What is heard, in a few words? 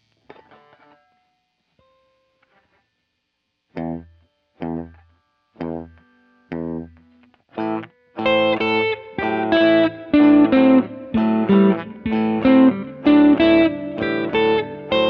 Simple Solo For Beginners